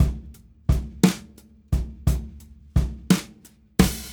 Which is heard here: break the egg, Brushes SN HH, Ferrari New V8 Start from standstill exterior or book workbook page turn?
Brushes SN HH